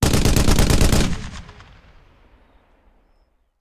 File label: Machine Gun; Sound Ideas; Guns